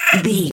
goblin fairy hurt pain

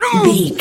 voice male hurt pain

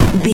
retro impact hit general

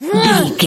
voice female attack

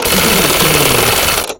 gun auto barrel spin fast

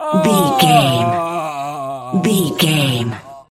voice male death torture

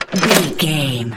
gun machine gun auto heavy reload

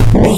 retro explosion short

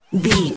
bullet flyby

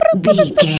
voice fun character vocal crazy

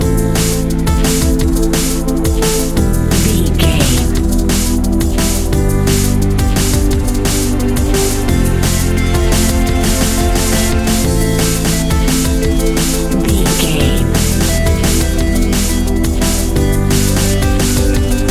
drum and bass piano fusion mix; Drum & Bass Piano Fusion 60 Sec Mix; Catch 22 Music Network

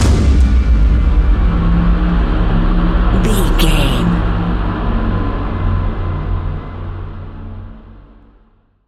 nightmares stinger

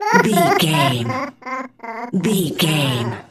goblin fairy cry low

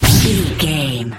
sci-fi weapon blaster laser boom zap

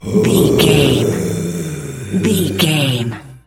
voice male growl

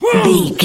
voice male attack

